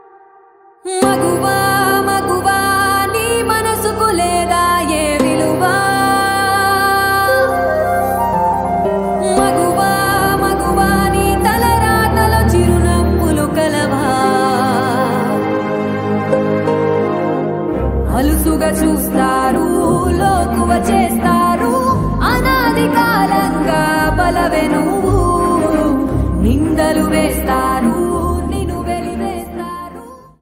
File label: Female Version BGM Ringtone; Vakeel Saab; Telugu